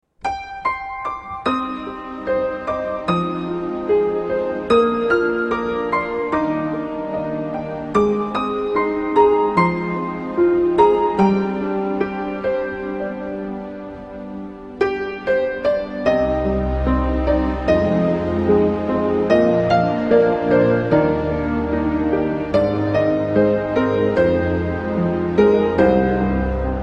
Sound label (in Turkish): piyano